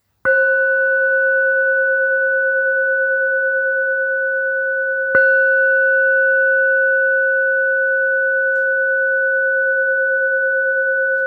337 ish, tibetska misa ishana black 337 g

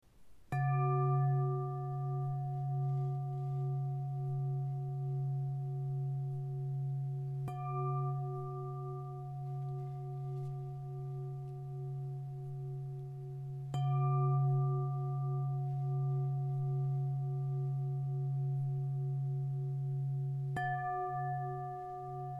136 1 hz, zavesny meditacni zvon 136 10 hz zeme

